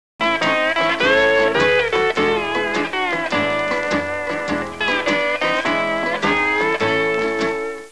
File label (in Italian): musica strumentale